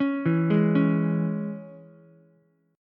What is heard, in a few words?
C sharp Minor down Ukulele; C#m; arpeggiated; JGuitar